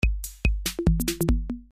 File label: click clink loop